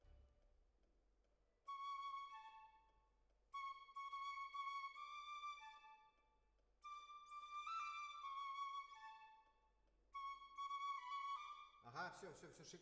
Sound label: Decca, Jazz for piano